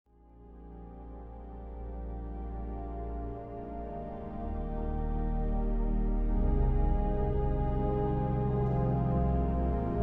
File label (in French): Chanson Improviste